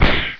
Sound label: punch; dopewars